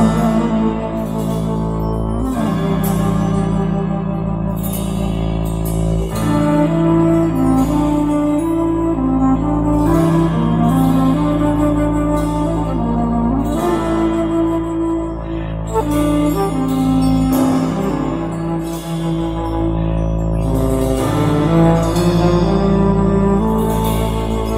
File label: flute bad juju, Loops, Sad